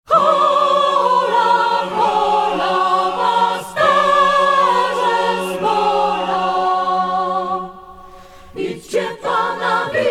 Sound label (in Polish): chor canon koledy hola hola